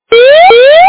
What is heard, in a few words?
alarm